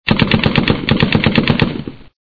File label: distant shots